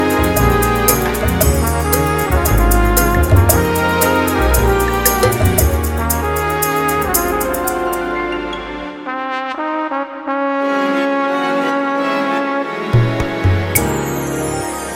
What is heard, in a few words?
Super Orchestra